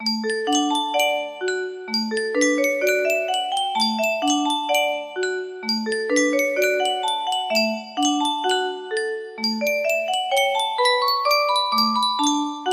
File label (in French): yunsheng boite a musique